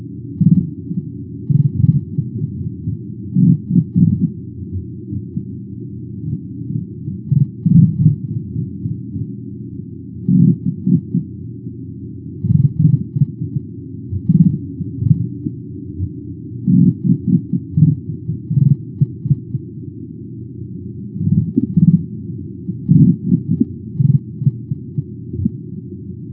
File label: Mantis Shrimp busy chorus +6dB